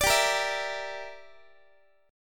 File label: G Minor 6th, Guitar; Gm6 strummed; x,x,17,17,14,15